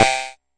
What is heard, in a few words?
Jomox MBrane, drum machines; Jomox MBrane 11